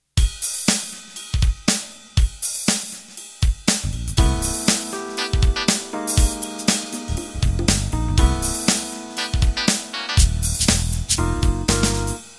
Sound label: Jazz Funk Intro